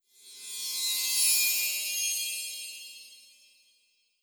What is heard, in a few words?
Mirror